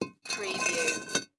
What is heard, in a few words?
Cinder Block Set Down; sound effects bible